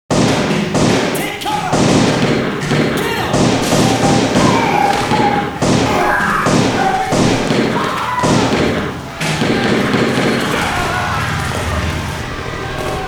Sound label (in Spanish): sonido hospital; ze hospital night